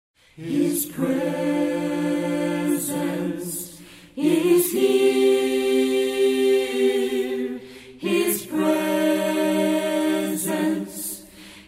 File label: Acapella; worship